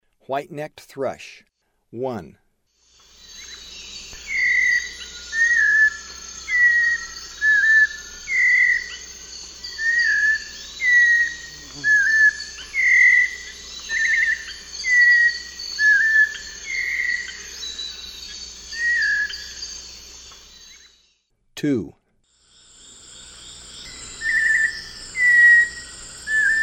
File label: White-necked Thrush; S. America; Eastern Lowlands